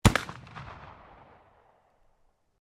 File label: PISTOL BLACK POWDER 44CAL FIRING, Black Powder firearms at a shooting range; Pistols (Sound Effects:Guns)